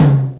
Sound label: Toms, Real